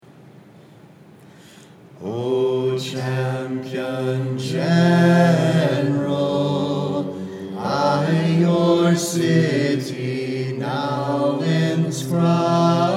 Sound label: mixed voices; O Champion General